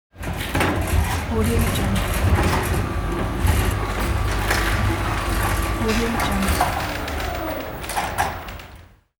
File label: garage door opening